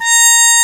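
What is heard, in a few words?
A#5, mute trumpet; AKAI S-Series CD-ROM Sound Library VOL-2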